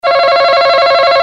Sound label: buzz in